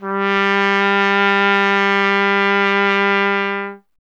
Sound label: trumpet, G 0; Miroslav Vitous; Solo Instruments VOL-1